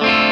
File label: clean Arpeggio down C#m7